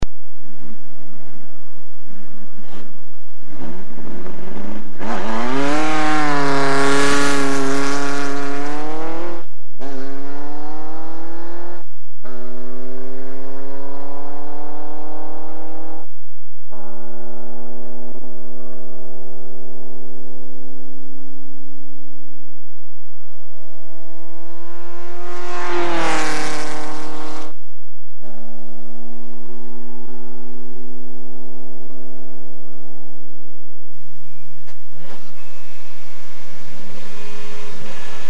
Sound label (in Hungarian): Bugatti T35b, motorhangok; autosport